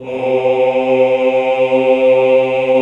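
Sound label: choir C2, Ooh; Sound & Vision; Gigapack I CD 2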